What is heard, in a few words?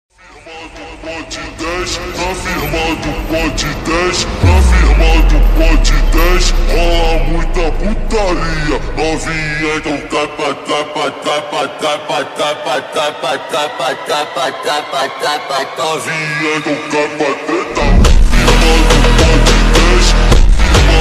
slowed reverb